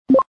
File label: open